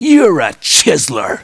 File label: LAUGH; BattleSNDS